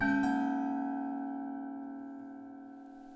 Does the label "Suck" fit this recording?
No